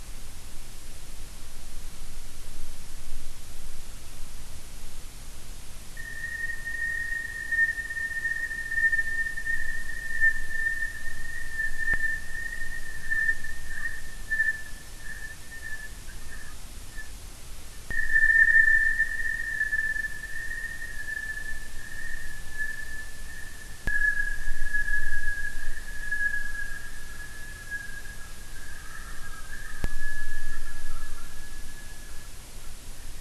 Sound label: WFR audio emfisis